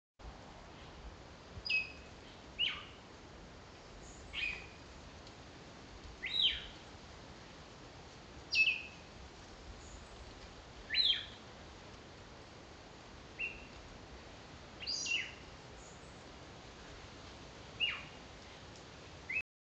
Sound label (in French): Moqueur corossol Margarops fuscatus